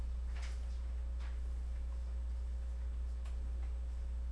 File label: bottle